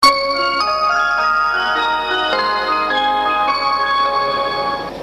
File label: bus chime